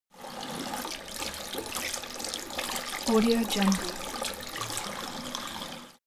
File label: elements water sink medium flow wash; smartsoundfx